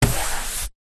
household brush hand held sweep internal hollow wall single stroke; zapsplat